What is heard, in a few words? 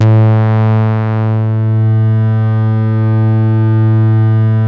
PHAT BASE, BASSES; Masterbits; Vintage Voltage